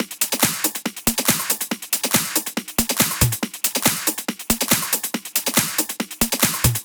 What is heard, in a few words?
Loops 140 BPM Hihat Percussion Loops; Vengeance - Essential Clubsounds Vol.4